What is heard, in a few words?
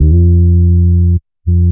Bass